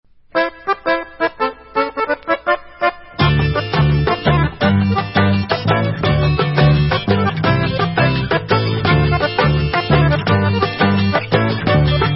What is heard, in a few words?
Hora Instrumental